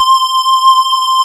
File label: SYN SQRS, JV 1080; Pro-Rec - Synthsonic